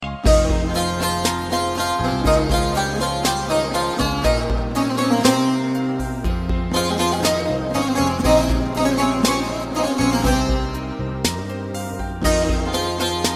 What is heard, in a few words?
terkish guitarra